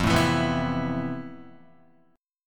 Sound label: F Major Sharp 11th, Guitar; strummed; JGuitar